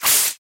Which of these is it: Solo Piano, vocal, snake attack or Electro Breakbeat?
snake attack